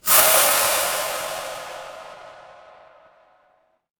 magic scifi spell

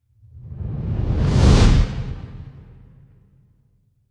fire electric whoosh flame

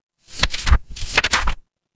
paper fabric page turn

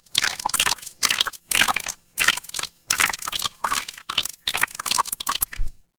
water swirl

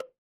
cartoon bonk

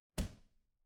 impact punch

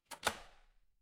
door lock

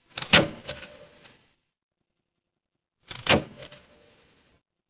mechanical lever

